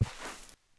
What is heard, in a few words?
Foot Sand; Civ4 beyond sword